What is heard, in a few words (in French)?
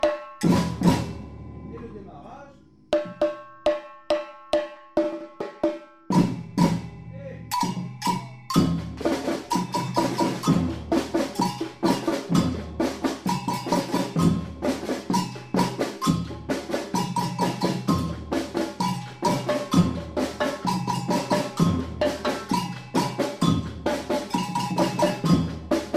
samba reggae demarrage, batucada